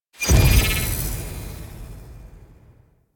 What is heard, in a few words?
Orb Heist Glyph Scanned; Venus